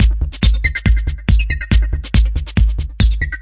140 finger licking acid techno, drum loops and breaks